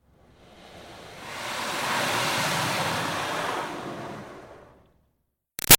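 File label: Water Spray Interior Car By Soft Slow; Car Wash; Spraying; Objects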